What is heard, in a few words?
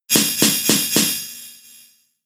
Helping Hand; Pokemon; gen 6; XY; ORAS